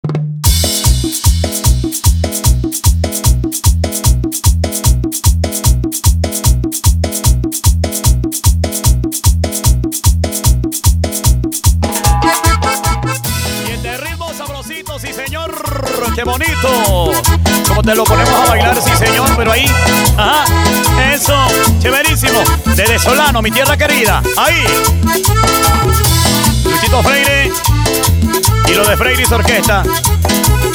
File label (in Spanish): Intro Simple Bpm 150